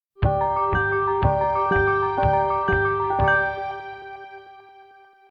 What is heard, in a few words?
Mystery Blues, notifications